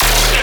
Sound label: Bass Hit D#, Dubstep; Ghosthack; Dubstep Sounds 2025; Luster Bass